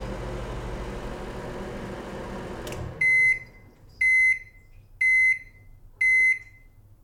Microwave Stop

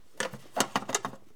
Picking Up Tacklebox

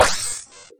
Sci Fi Button Click

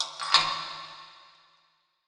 Dungeon Lock

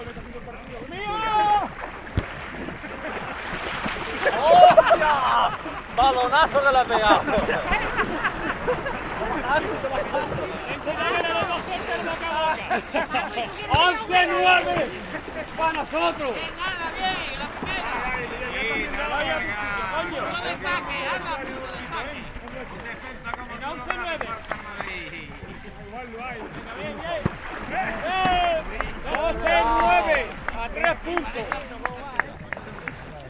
Volleyball

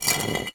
Brick Scrape

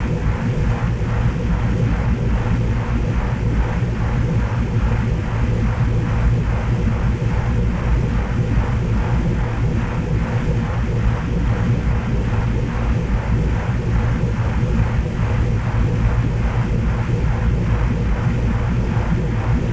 Fan

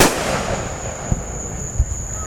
Fireworks Explode

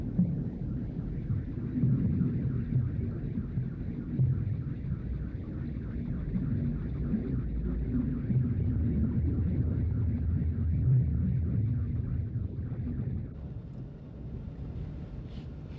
Sub Int Or Ext Ambience

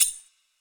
sword

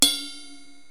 Splash Bell

